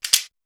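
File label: RAKATAK 1, WORLD PERCUSSION; East West; Global Sounds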